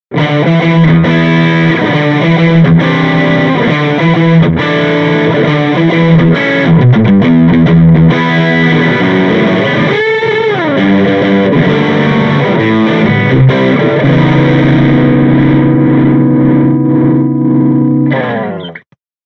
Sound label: Mini Rox Mic Inside Jensen C12N Distortion Stratocaster